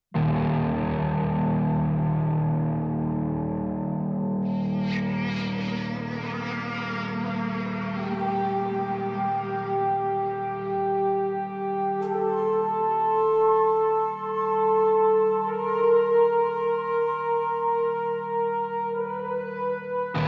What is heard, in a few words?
Explore Balcony; Infinity Blade 3